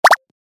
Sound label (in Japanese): ブロックを動かす